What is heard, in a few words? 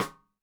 Snare, Roland TD-20